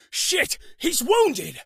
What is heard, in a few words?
wounded; st allies; darkwing